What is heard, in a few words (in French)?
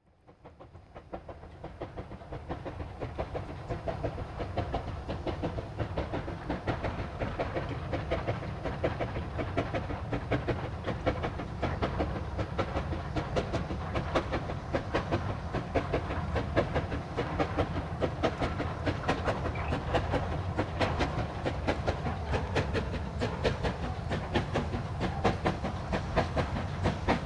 trains a vapeur a voie etroite; sonotheque